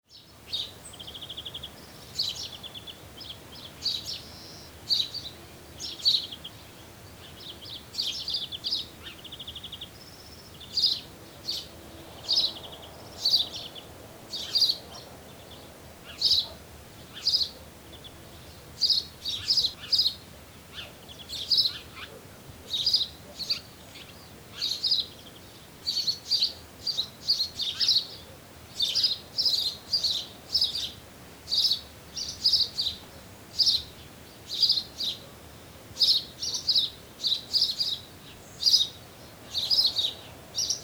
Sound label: birds in may